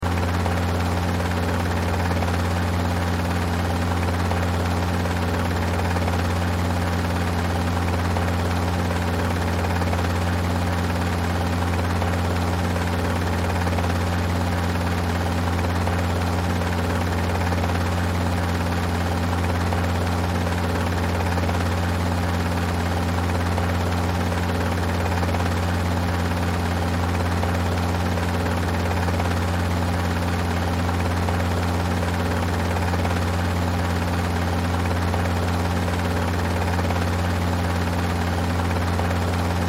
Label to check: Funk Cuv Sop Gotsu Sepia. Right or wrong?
Wrong